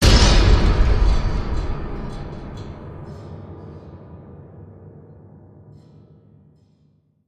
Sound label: Hit Big Piano Slam